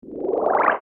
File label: Bar chart expand animation infographics